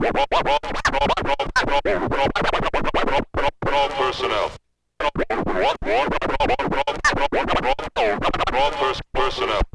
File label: Scratch; Matt Hoffman's Pro BMX 2; XBOX